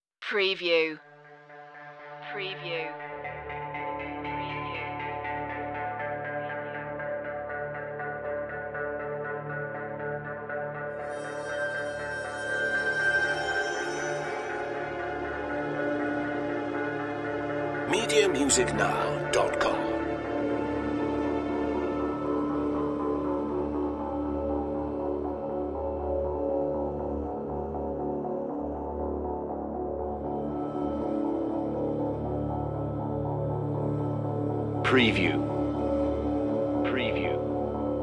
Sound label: pad drama